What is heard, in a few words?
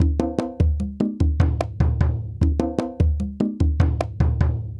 African Drum 75 bpm